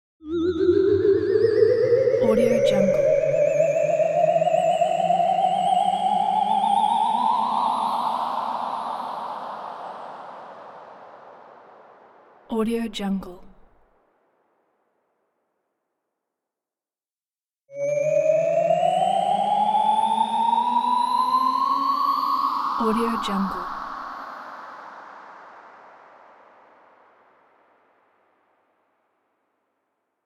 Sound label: spaceship; cook-sfx